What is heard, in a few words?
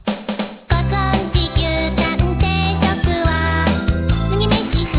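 guitar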